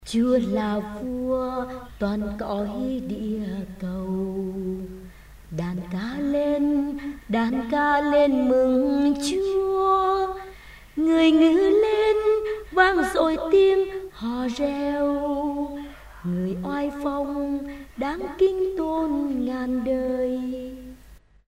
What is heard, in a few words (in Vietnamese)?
vo tay hat mung